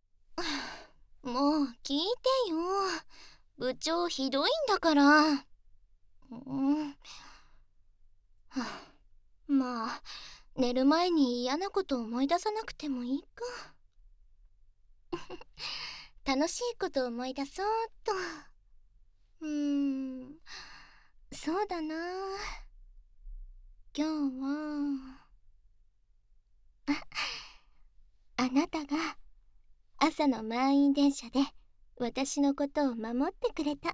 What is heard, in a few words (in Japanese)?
キス; おやすみ前; お姉さんの耳舐めがないと僕はもうダメみたい。